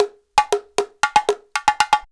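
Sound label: emelako grooves; Best Service; World Colours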